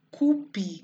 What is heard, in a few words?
squat